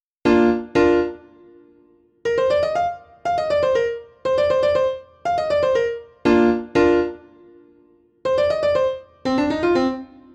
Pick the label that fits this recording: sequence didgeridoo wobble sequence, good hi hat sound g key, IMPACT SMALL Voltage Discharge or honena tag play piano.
honena tag play piano